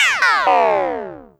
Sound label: Sound Death; arcade-canvas; ninja-runner